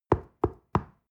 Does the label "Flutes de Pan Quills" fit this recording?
No